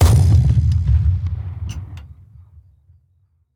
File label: tools tankgun; teardown ps5 windows xbox series xs gamerip 2022